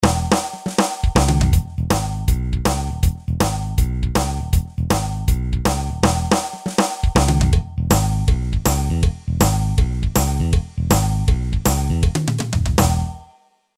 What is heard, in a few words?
Rockabilly; BOSDR670